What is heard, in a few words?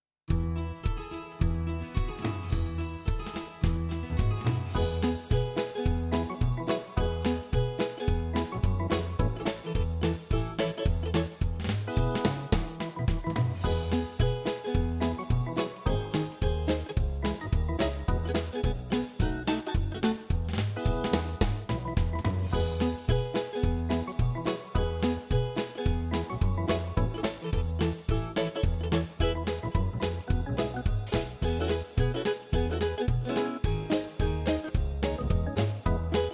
music only